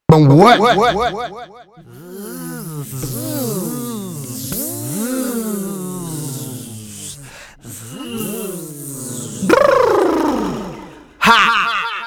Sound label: acap 83bpm